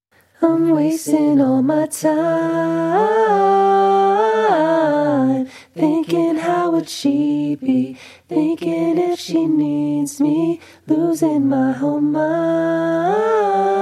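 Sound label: pop 95 A minor, Acapella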